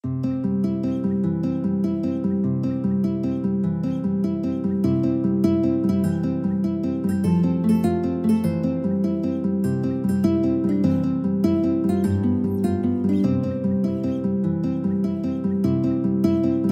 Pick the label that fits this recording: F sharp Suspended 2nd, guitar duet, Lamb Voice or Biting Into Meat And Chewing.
guitar duet